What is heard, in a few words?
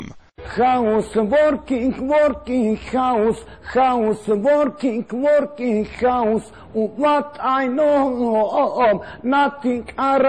House Working